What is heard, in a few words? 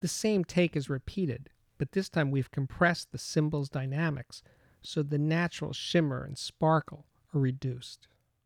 Cymbal Dynamic Range Mildly Compressed Announcement; The Ultimate Headphone Demonstration Disc ( 24Bit192kHz ); Chesky Record